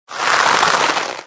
Grow Sound

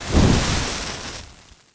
Gumbo Fire; Diner

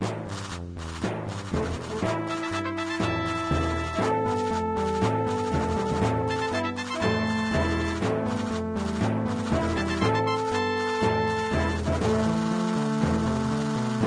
End Level

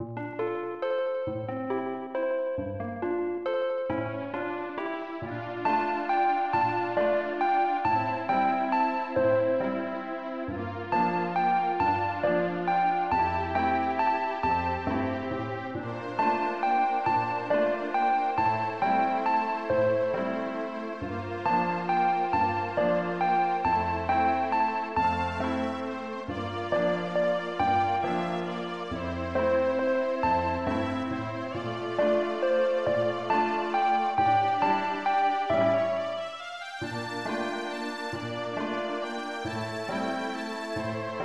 Harp

